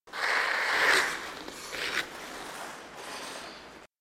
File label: Hockey Skating Skat, ice skating